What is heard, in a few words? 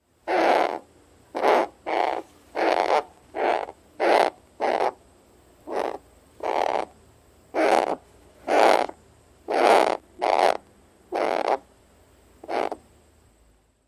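Harris's Hawk calls of captive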